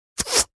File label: Arrow fly by whoosh